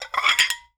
Plates Removing; Kitchen

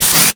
Aerosol Can; Bathroom

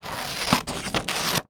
Cutting Paper with Scissors; Office Supplies; 1001 Sound Effects

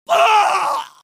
Screams Male; Horror Effects

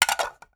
Plastic Bowl, Kitchen

